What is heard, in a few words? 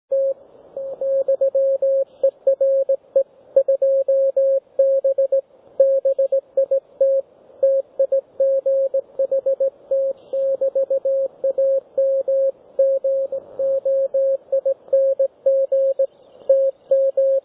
One Valve TX; 60m; 1Valve Tx 5263kc; G3VTT; 160m